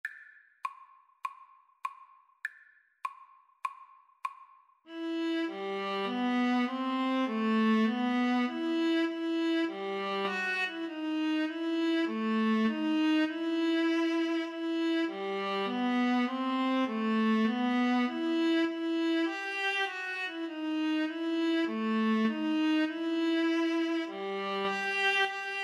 Viola Duet

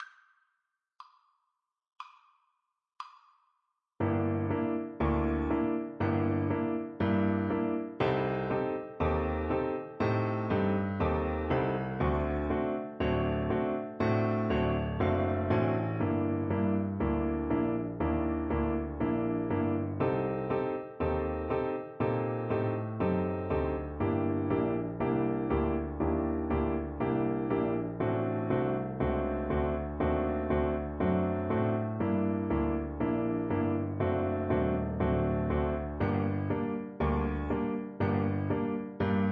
Euphonium

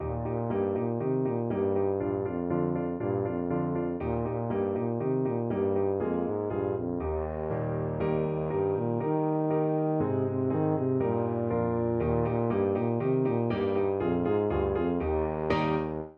camptown races, tuba

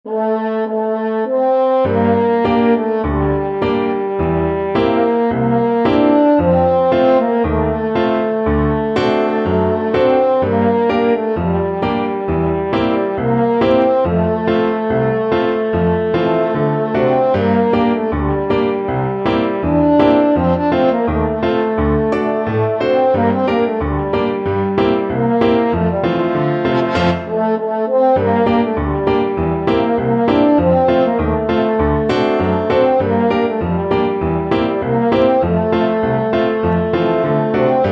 kinokio, french horn

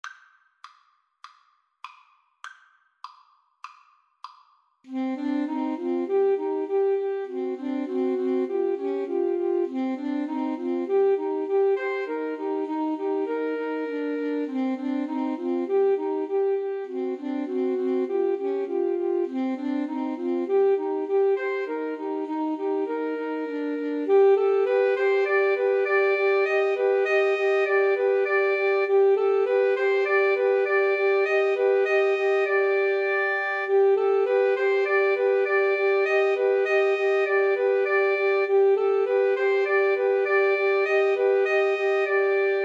grieg mountain king, Alto Sax Trio

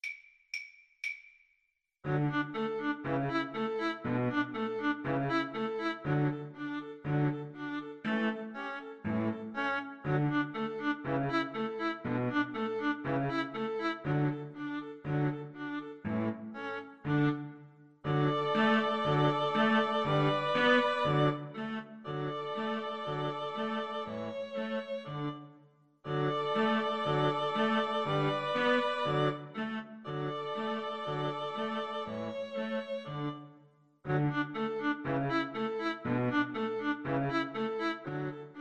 String Quartet

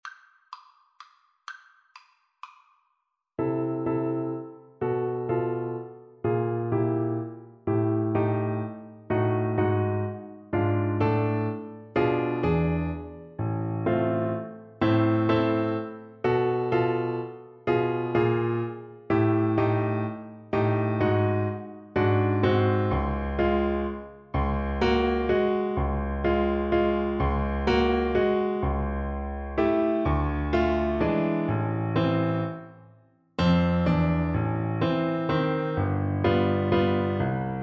mazurka, Cello; op68 no4

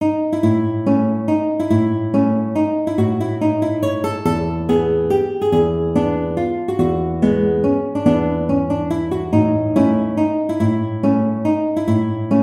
Waltz, guitar

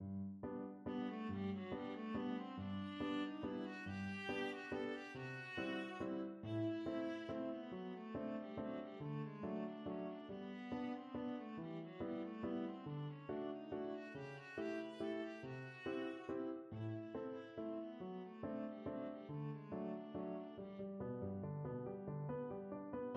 viola; Berlioz Symphonie Fantastique

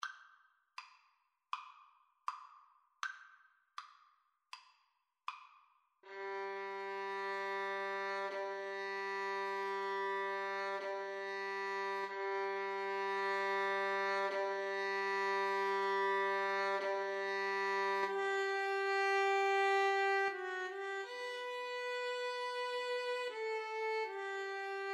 ave maria, Violin Viola Duet; schubert

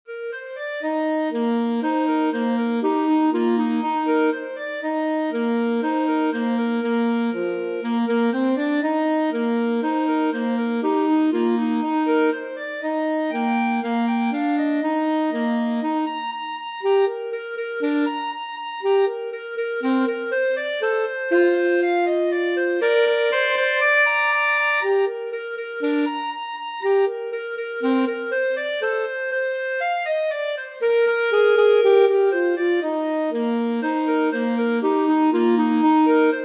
baltimore belle, clarinet saxophone duet

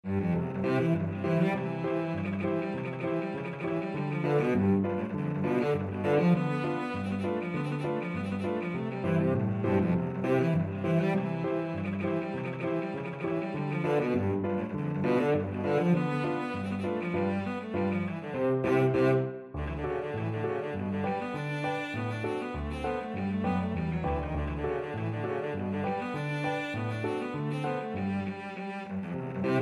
clarinet polka, cello

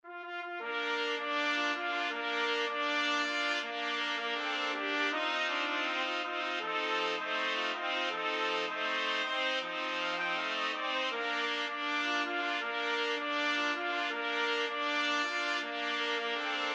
la cucaracha 4TPT, trumpet quartet

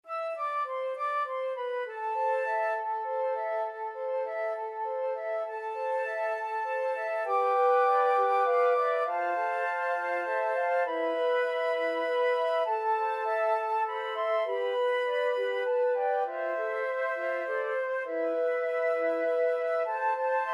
flute choir

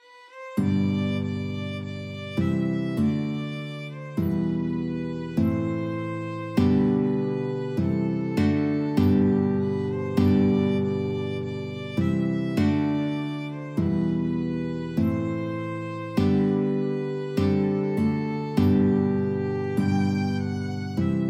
hail holy queen violin guitar

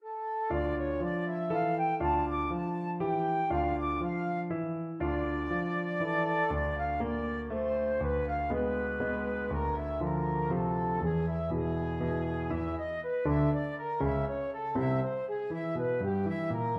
peer gynt, flute

